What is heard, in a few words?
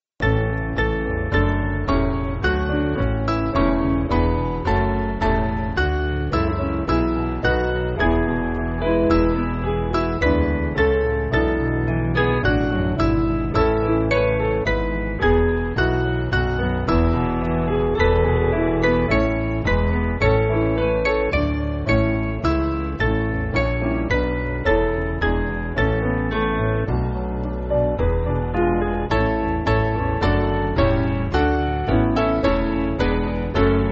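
Piano, Backing Files for Churches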